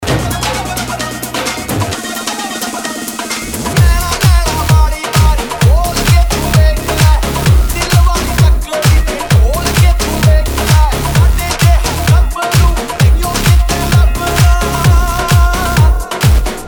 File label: rum whisky remix